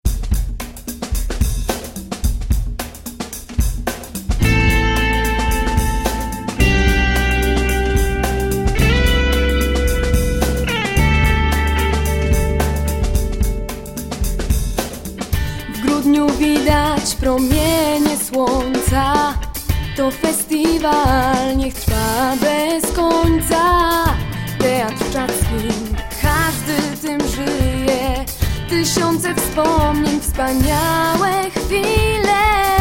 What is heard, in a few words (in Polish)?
jingiel